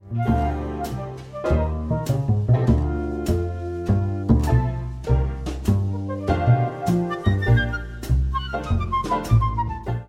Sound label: Easy Listening